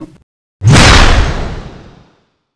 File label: hammer slash